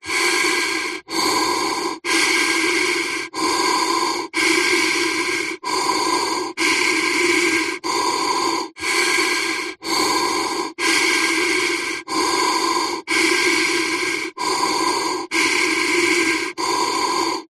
human breathing firefighter mask pov steady deep breaths